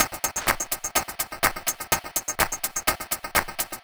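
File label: percussion, Drum Loops 125bpm, Nu Dance Electro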